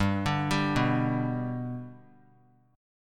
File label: G Major down; G arpeggiated; JGuitar